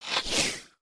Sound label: bow pull back short; weapons; aronwk collection; ndaudio